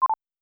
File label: beep short off